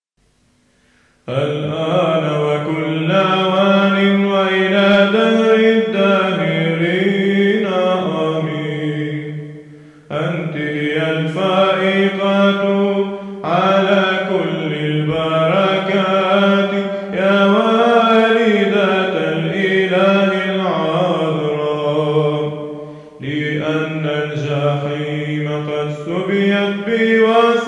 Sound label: Tone 6 Before Doxology, Hymns; Kyamiyat 8 Tones Hymns; Doxologies